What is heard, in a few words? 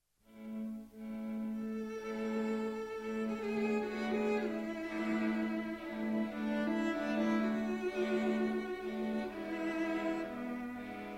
Notturno from String Quartet; Borodin